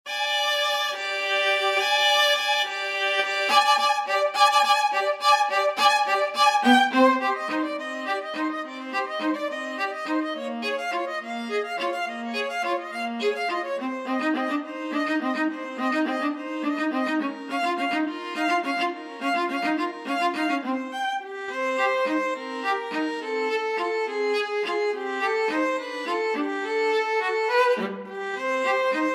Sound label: violin viola duet